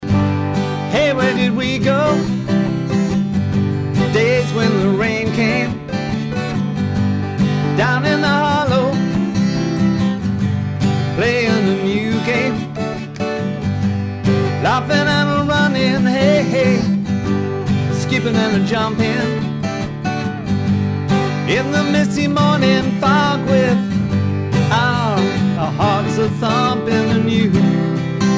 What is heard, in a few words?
acoustic